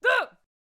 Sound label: Attack Vocals; Peasant